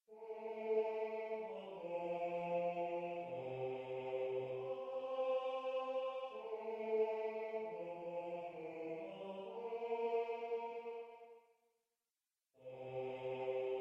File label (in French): choeur des esclaves tenor; nabucco